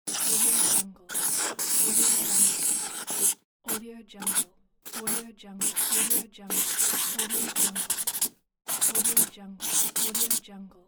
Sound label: marker scribble